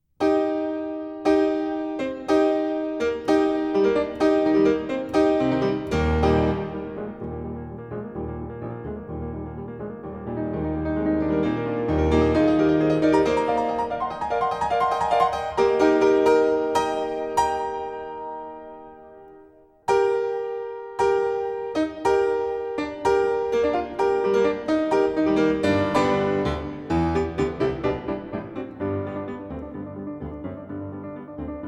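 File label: Etude-Tableaux in E-Flat Major, piano; Rachmaninoff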